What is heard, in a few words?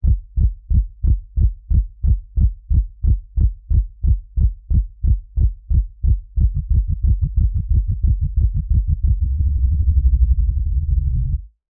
heartbeat or drum roll; rhythmic